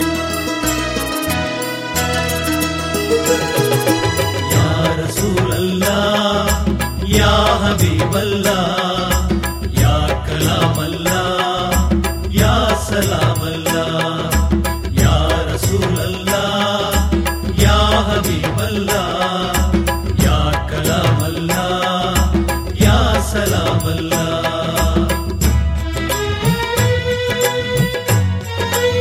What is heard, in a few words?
tamil islamic muslim, ringtone